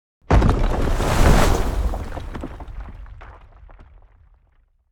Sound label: Dragon taking flight wings flapping earth shaking